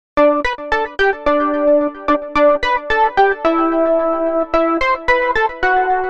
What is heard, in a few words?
christmas ringtone